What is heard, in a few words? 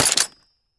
mech; Urban-Coalition; Decay; arccw ud